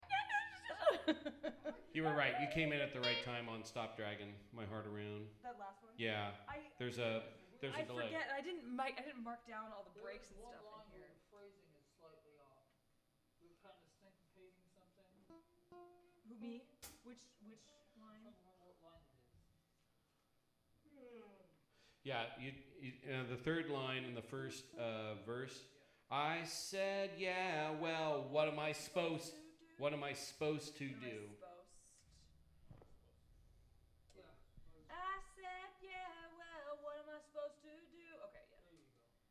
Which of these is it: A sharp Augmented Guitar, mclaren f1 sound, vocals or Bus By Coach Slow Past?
vocals